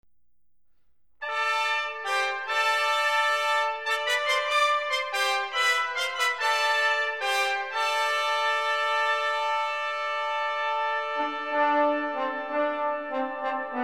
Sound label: Brass Ensembles; Agincourt Song; Traditional